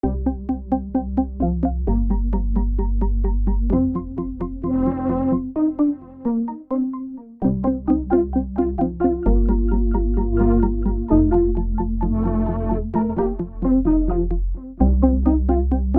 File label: Pad; XILS; Xils4; Pad Trapez A